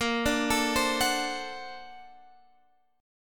A sharp Augmented Major 9th, Guitar DADGAD; 20,17,19,17,21,x

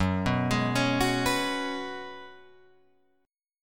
Gb Suspended 2nd Suspended 4th Gb Guitar Open F; Gbsus2sus4 arpeggiated; 6,6,8,8,9,6

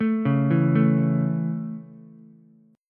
A Major, Ukulele; arpeggiated

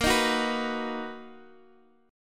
B Major, guitar chord; B/A#; x,x,8,8,7,7

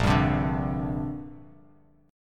Bb 6th Add 9th, Bass Standard; 6,10,10,7; Bb6add9 strummed; JGuitar

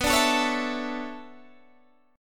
B Minor 11th Guitar Standard, Bm11 chord; Bm11 strummed; 19,17,19,18,17,17; JGuitar

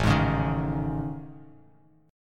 A Major 7th Flat 5th, down, Bass Standard; AM7b5 strummed; JGuitar

